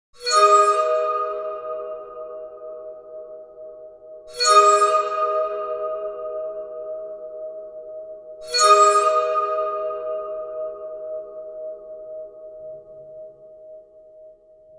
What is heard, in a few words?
spooky horror accents